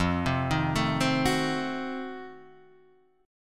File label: F 7th Flat 9th F